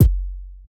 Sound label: Kick Hit; Hip Hop Exotica; Apple Loops